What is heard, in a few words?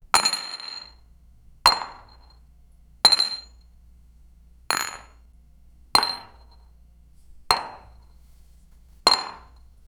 real world mahjong style